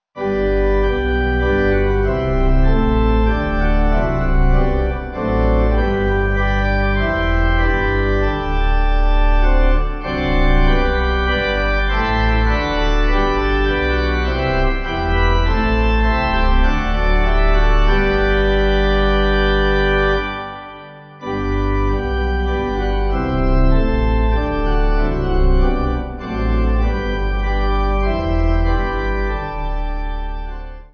Pipe

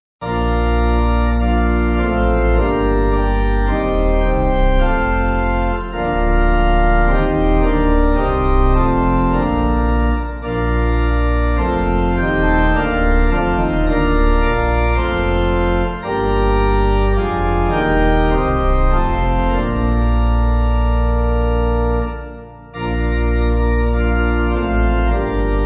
The Lord Will Come, Pipe

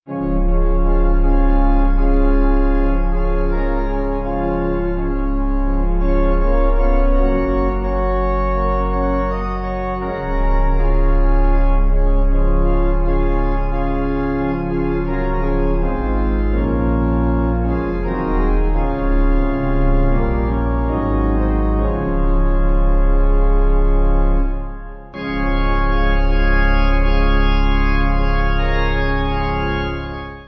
Db, Pipe, Pipe Organ; Assam

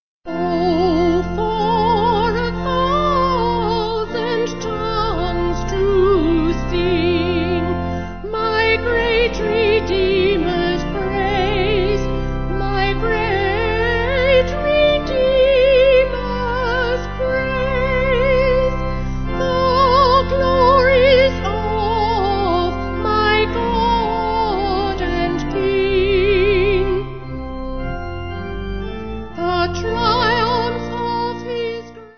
Vocal Organ

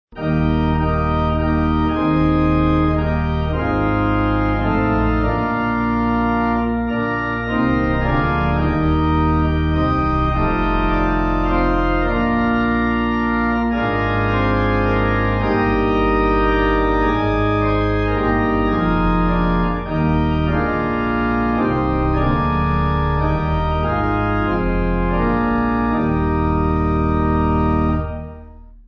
Pipe; Lord Of All Being

